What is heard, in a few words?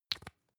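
lipstick close, Bathroom; haus-garten; badezimmer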